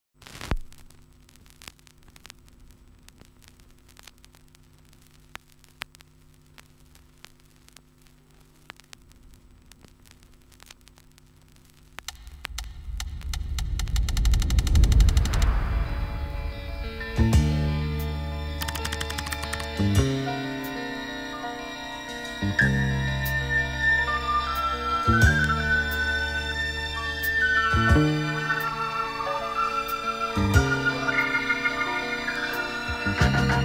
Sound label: Smooth City Pop, Nostalgic Funk